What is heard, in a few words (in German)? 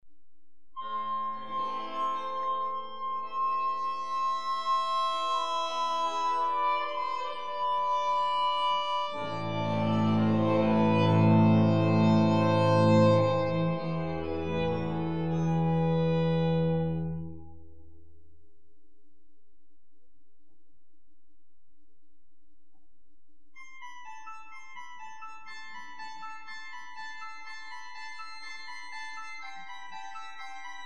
Blockfloete, Violine, Violoncello, Kontrabass und Cembalo; Für Sopranblockflöte, Violine, Violoncello, Kontrabass und Cembalo; In die Tiefe